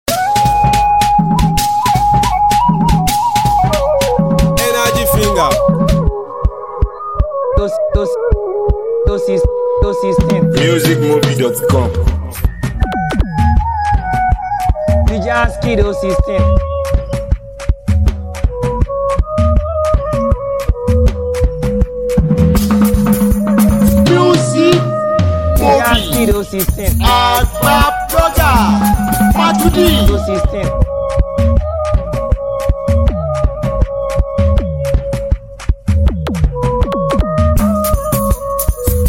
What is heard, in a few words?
BHANGRA INDIA BEAT